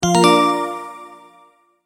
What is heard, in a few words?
multimedia tone start